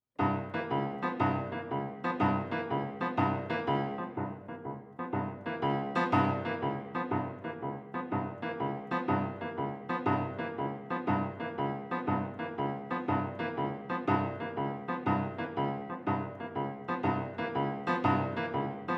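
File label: jazz music accompaniment only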